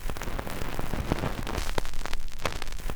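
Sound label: record noise; Sonic Reality - Vintage Timetraveler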